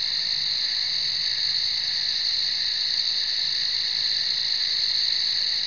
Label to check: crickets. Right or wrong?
Right